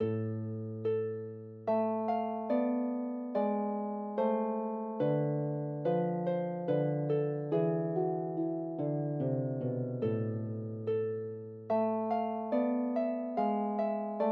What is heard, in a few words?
Traditional Welsh Airs, Harp; Variation on Traditional Welsh Airs